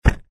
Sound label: trick front; disneyxd; stuntastic